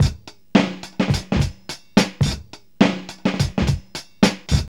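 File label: 106 bpm, Funky loops; Zero-G; Funky Elements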